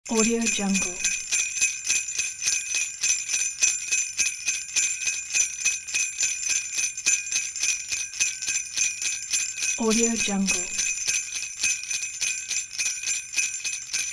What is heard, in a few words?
sleigh bells